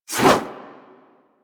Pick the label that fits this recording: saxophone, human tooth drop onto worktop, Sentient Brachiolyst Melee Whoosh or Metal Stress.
Sentient Brachiolyst Melee Whoosh